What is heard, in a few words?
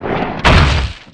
EMPERORWARRIOR HIT; Eft